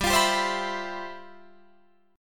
G 13th G Guitar Drop D, chord

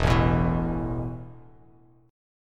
E Suspended 4th E, down, Bass Standard; Esus4 strummed

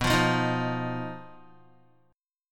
B Major Flat 5th B Guitar; strummed; JGuitar

